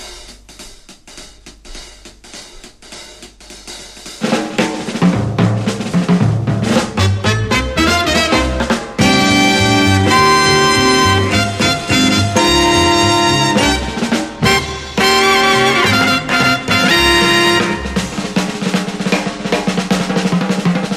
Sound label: DRIVIN DRUMS